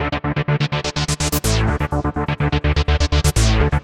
Arp, Synths; Arp Of Sorts; Sonic Foundry (Sony Creative Software); Underground UK House & Electro

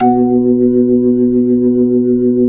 B-3, Jazz

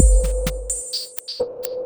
Beat, Drums & Percussion; Electrocution; Beats

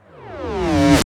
boing; Spectrasonic Distorted Reality 2; BEMUSED

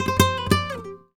Guitar, Flamenco, g; Best Service ProSamples vol.46 - Flamenco; Frase

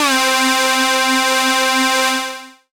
syn c, Dance Synths, Juno; Juno Jam

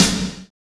SNR, Cheez Snrs

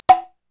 TSUZUMI, Korg Electribe EMX-1

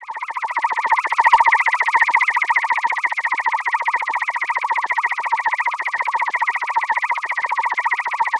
INSECT, Special FX Twisted; USB Soundscan; AKAI

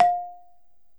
BALAFON F; E-MU Producer Series Vol. 7 – Old World Instruments

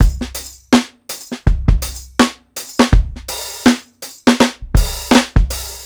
82 SWUNG OFF BEAT, DRUMS LOOPS; BASEMENT DRUMS VOL 2

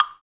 PRC BLOK, AFRICAN PERC; Roland LA

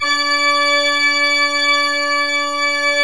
ORG D5, ORGAN; AKAI S-Series CD-ROM Sound Library VOL-8

